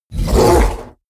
fishman vocal snarl short; kreaturen

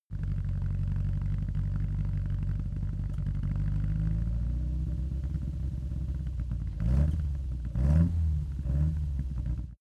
car opel kadett idle small acceleration

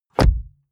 car bmw e46 interior door rear close; Cars

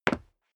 step sneaker concrete single stair up; schritte

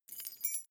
key ring mid size fly tinkle; objekte; schluessel

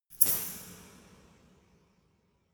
resonator hit double hard

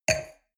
wine glass place table soft short; soundpool

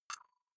click rough low

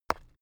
stone midsize drop forest short; natur-tiere; steine

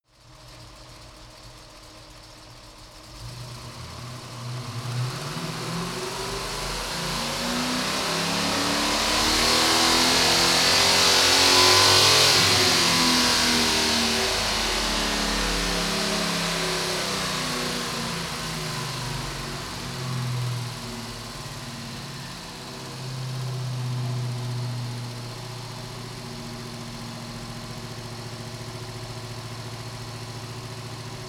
car camaro accelerate decelerate heavy engine

